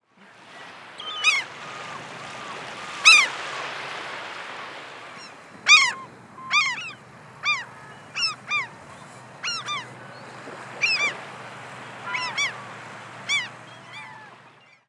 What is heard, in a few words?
short billed gull larus brachyrhynchus